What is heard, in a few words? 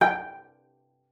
cello pizz G5; 90 s Sample CDs; Xsample VOL-5